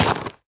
step, footsteps player debris

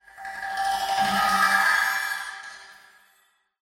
wood rattle; Half Life Alyx; Half Life Model Pack

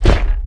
dog footstep walk; Half Life 2; Episode 1

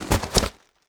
cardboard swarm impact, debris

